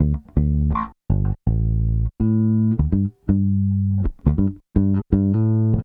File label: Soul Bass, 70s Analog Funk